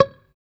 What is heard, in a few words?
D6 sus, Strat guitar; Spectrasonics; Hans Zimmer Guitars VOL-1; JC-STRAT MTE